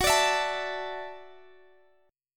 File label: Gb Minor 7th Sharp 5th; Gbm7#5 strummed; x,x,16,19,17,17